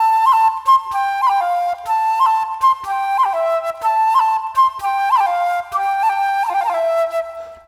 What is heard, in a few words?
flute, ethno; eJay